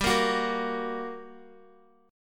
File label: G Minor Major 7th, down, Guitar; GmM7; strummed